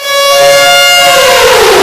Robotic Elephant

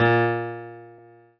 Piano Ff

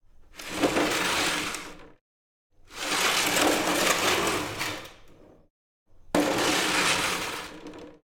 Crate

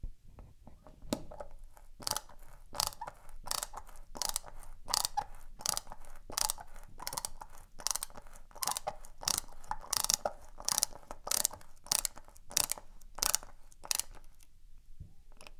Double Drive Screw Driver

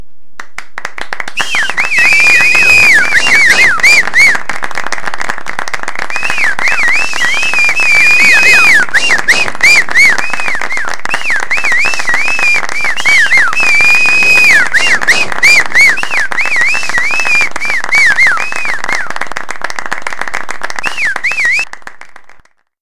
Yay

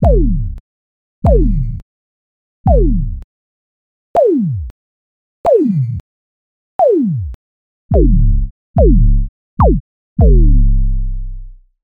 Magic Laser Bassy Dubstep Laser Pew; Gwen

